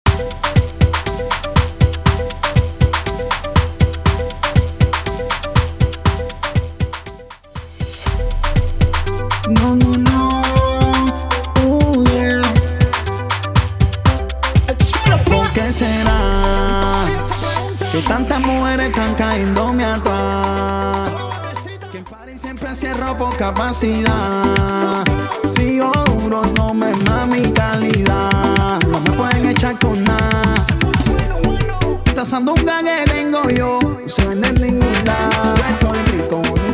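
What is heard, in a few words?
Intro Clean Outro 122 Bpm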